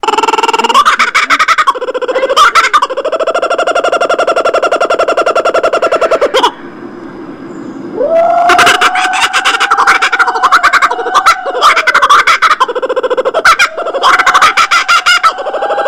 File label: Kookaburra